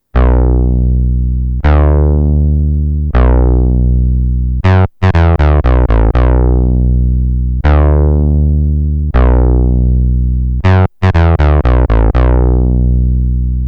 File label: smooth filter